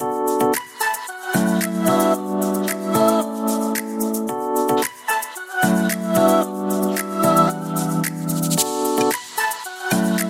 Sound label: music